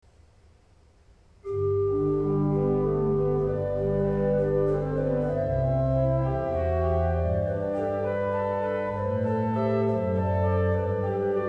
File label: Organ Like A Dove